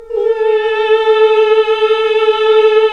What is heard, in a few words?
Nuns Mmmhh; Sound & Vision - Gigapack II